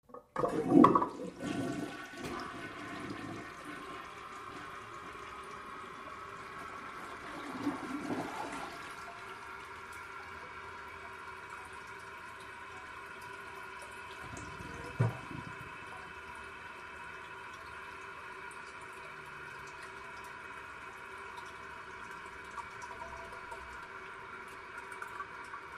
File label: Public Toilet Flush C